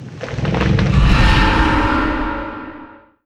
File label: HOLY STONE KEEPER war attack